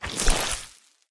human form long move; flood

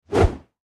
warhammer swing; skyrim warhammer swing1

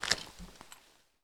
generic grip light, weapons handling

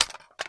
bizon maglatch; weapons

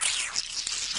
infector idle; flood

